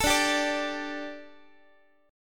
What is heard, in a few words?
D Suspended 4th D, Guitar; strummed; x,x,12,12,11,13